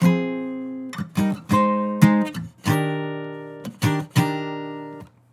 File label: Acoustic Chord Loop - 180 BPM Eb Maj; Cymatics